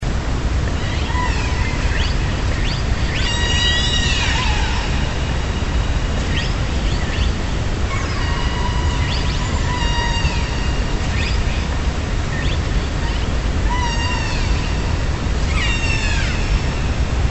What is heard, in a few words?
alaska residents alaska killer whales